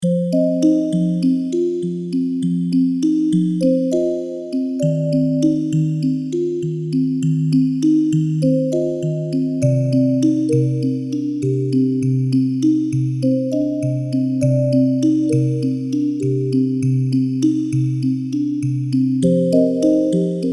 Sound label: Brushed Bells In The Wind; Ambient